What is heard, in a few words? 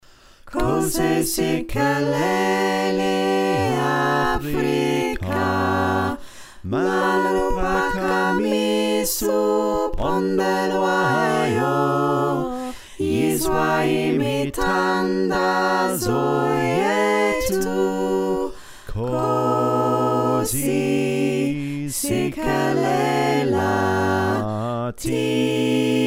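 soprano alto tenor bass, choir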